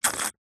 human drink tea coffee sip slurp

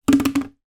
foley bottle plastic drop on side on table

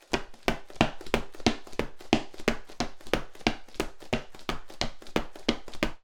Footsteps Male - Boots Lino Run

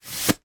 poster slide into tube; foley

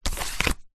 leisure playing card turn over on table; zapsplat

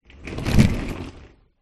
household pull along shopping trolley pass by

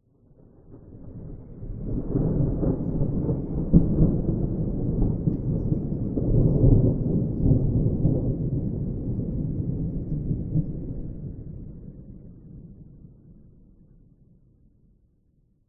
nature thunder single distant rumble no rain; zapsplat

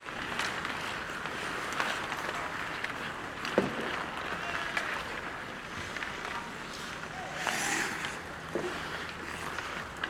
ICE Ambience skating rink outdoor

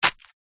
foley footstep single sandal concrete light dirt; zapsplat

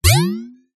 sound design fm burst hit metallic; zapsplat

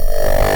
glitched tones Feedback FX

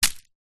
foley bark chippings handful throw onto dirt

